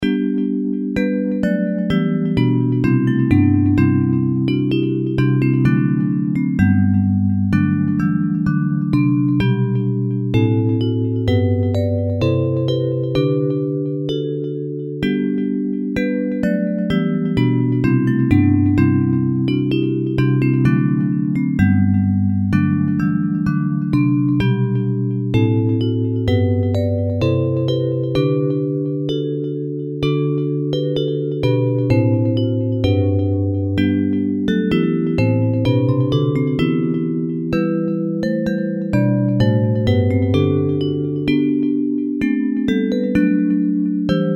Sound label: Bells